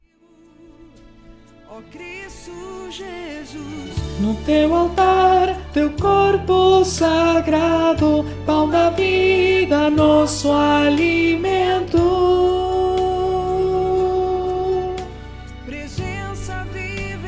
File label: contralto; comunhão